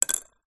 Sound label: household broken ceramic cup pieces down on surface; zapsplat